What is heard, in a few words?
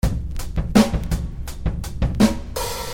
drums; 70s drums